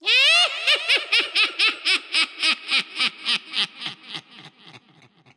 risada do esqueleto

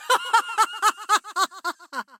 risada; senna lol

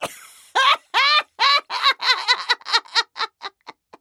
risada comica

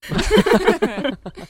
risada de reacoes

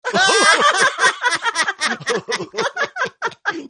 risada cartoon

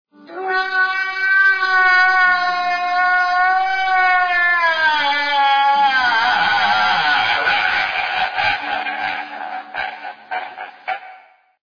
homem chorando

